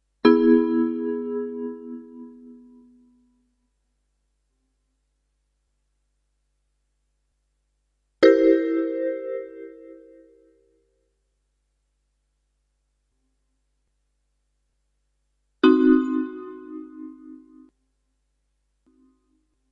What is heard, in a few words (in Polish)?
akordy